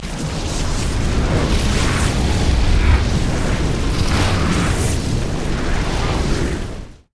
scorched earth; LoL; shyvana

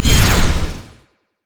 shield; rumble skin03

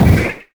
basicattack; LoL; janna

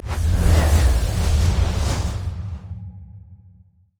blast wind; lissandra skin01

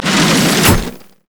rock pulley up; LoL; emitters map8; odin

